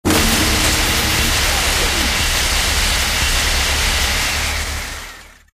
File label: walkway move; ambient; Source Engine; tf